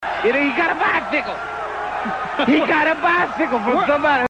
bicycle; dusty rhodes

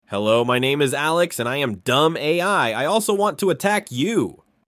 mmmmmmmmmmmmmmmmmmmm

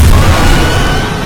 jumpscare; one night with cuphead 2

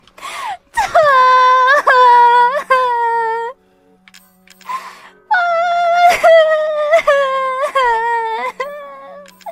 suffering; fauna

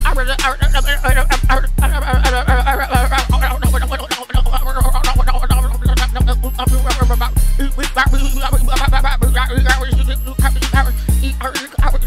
fast rap

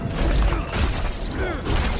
heart

